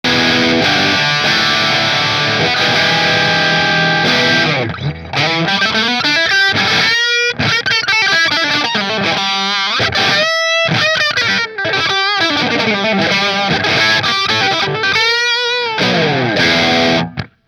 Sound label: Showmaster position 5 marshall, guitar; Fender Custom Shop Showmaster